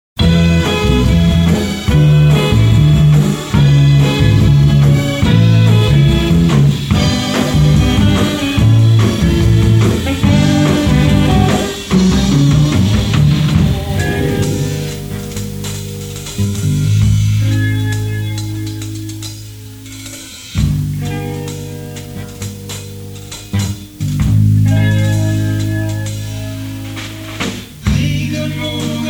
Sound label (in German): Achterbahn